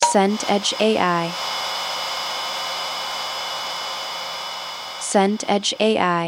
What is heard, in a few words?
kettle boil